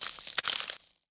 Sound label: scarab; Single Scarab